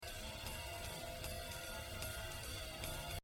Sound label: Cymba; Buddy Rich; The Evolution of Jazz Drumming (Gottlieb)